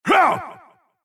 MobVoice Black Knight Attack

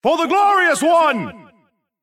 MobVoice Black Knight Regen

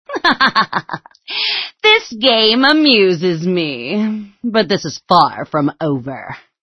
die; Cygnus

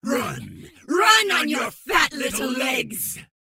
Mob Voice Mad Mage Regen

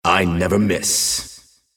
Mob Voice Vicious Hunter Attack

